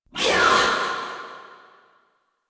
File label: Unyielding Gallop Cast Vocal; Warframe; Dagath